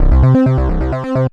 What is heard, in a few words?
Synth